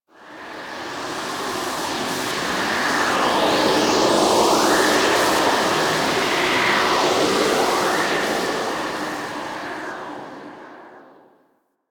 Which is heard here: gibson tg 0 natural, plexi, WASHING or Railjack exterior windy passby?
Railjack exterior windy passby